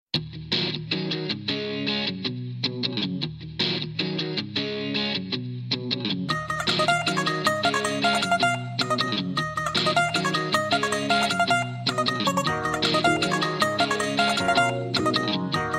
Band Baja Baraat

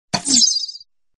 Arrow Swoosh

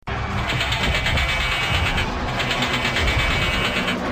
Bionic Sound

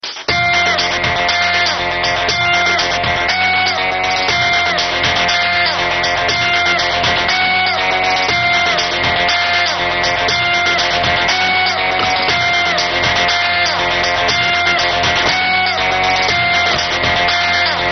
Bgm; Billa2

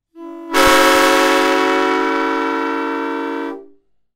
Train Airhorn

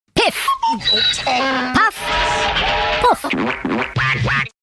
Piff Paff Puff Sms

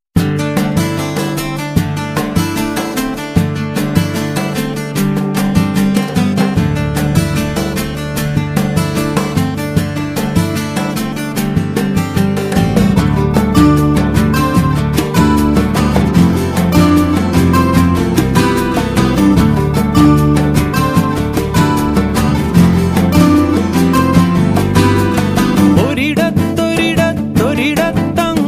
Nice Guitar

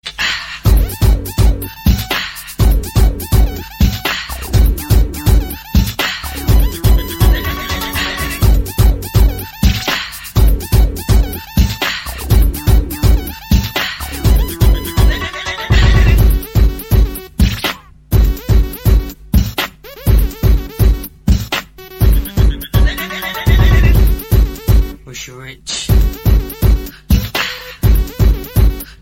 Beep

